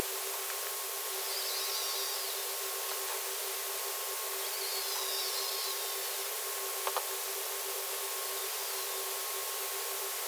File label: Arnoux beaked whales